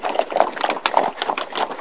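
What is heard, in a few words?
horse loop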